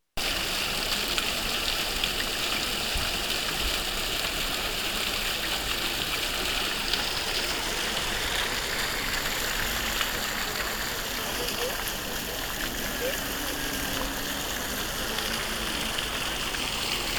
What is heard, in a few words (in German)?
Bahnhofvorplatz Linz